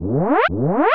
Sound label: Bonus mode start; Blastmorph Pinball; xbox series xs